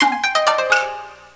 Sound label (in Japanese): Trinityの琴とSC-88のEthinic Kit。; Japanese1